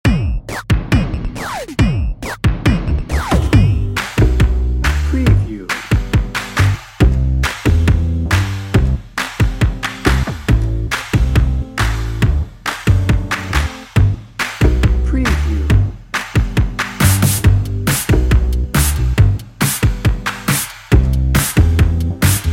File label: bed